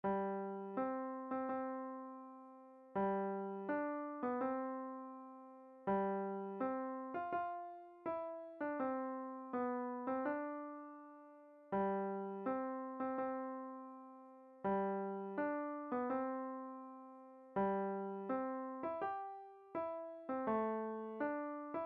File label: Dotted Quarter Bridal song, beginner piano music; Wagner